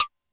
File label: Re Synth, Alesis D4 drum machine